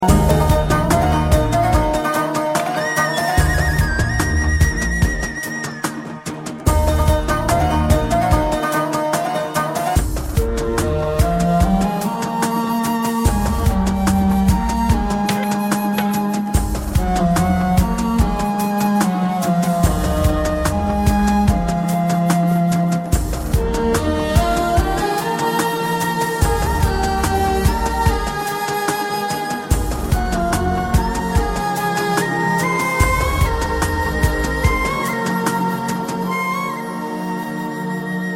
Song Instrumental Tone

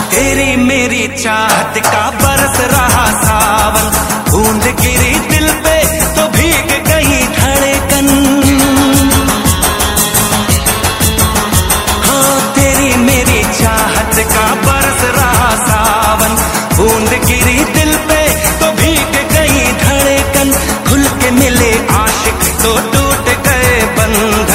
Song Ringtone

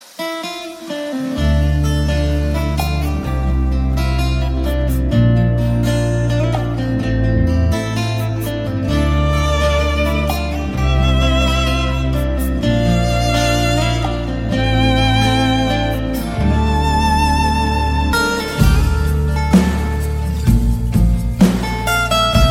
Instrumental Tone Ringtone, Telugu Song

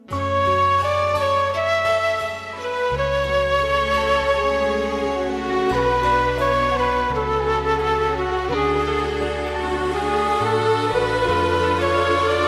Instrumental Ringtone

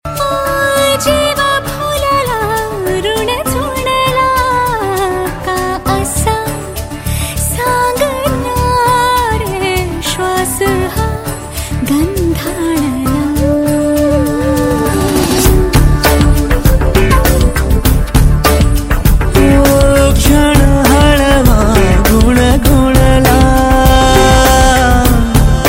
Marathi Ringtone

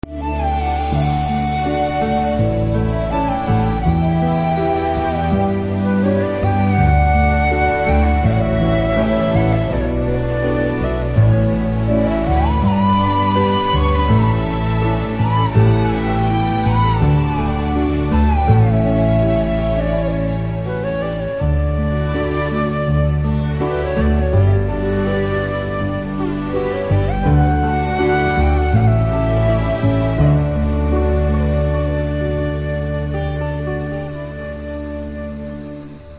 BGM Ringtone

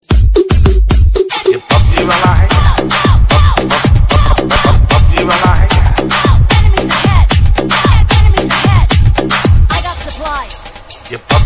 Tone; PUBG

